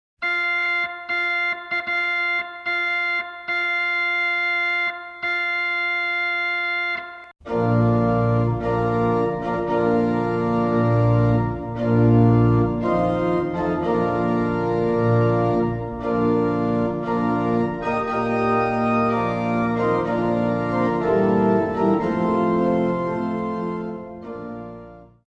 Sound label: Here Comes Bride Organ